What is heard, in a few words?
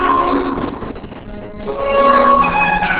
soundstream music opus 6kbps